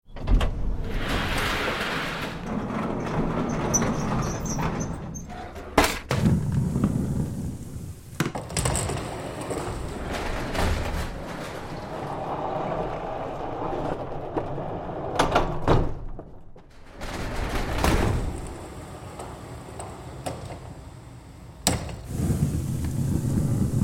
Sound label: Sliding Rolling Doors; Big Room Sound